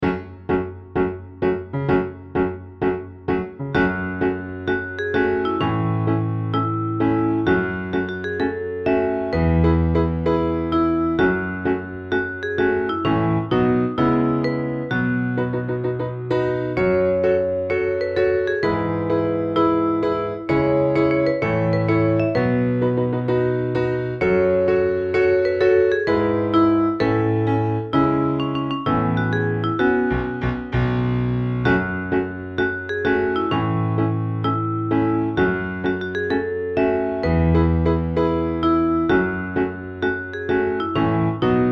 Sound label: Piano Vocaline